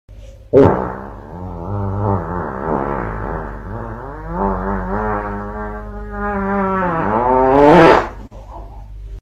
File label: Fart Sound Effect